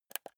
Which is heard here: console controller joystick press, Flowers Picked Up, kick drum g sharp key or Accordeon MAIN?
console controller joystick press